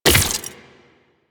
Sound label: Rifle Mech; DE; Warframe; Weapons; Sentient; Sent Rifle New War